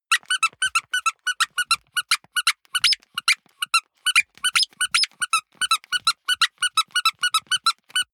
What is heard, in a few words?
squeaker squeeze sequence long